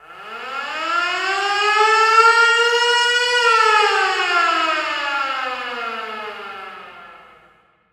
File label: Hockey Goal, Hockey Horns & Buzzers; Colorado; SFX Kit